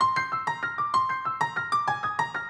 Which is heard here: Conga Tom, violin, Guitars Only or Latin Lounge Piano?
Latin Lounge Piano